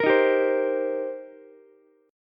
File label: C Minor 7th C Ukulele; Cm7 strummed; JGuitar